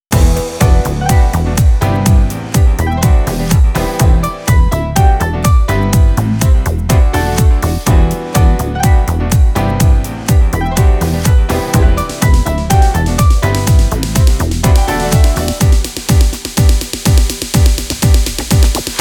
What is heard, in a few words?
house electronic techno; By Burak Sound Bank; house electronic techno vol 4